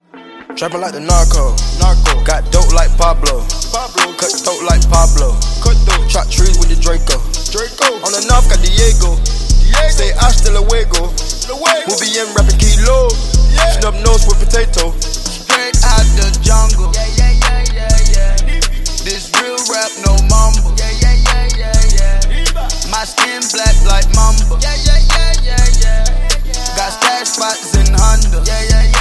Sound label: english song ringtone